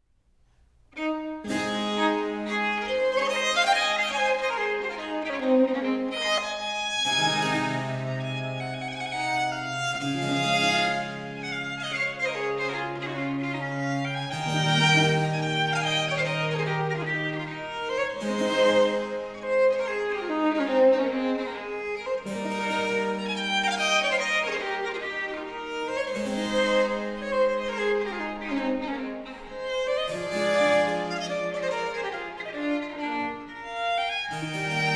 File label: Ayres for the Violin, Suite in A Major, Preludio - Presto; Book 4; Italian Baroque Violin Sonatas; Nicola Matteis; Ayres for the Violin, Vol.1; 尼古拉斯 · 麦克吉根